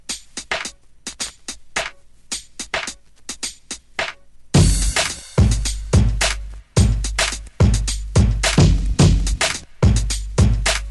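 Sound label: instrumental beat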